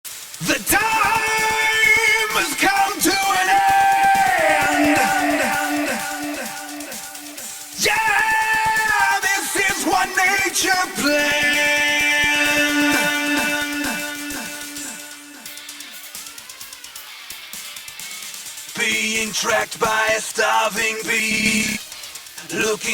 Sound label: Vocals Only; Metal Gear Rising